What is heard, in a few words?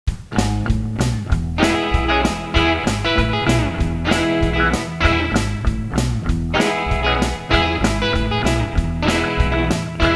country rock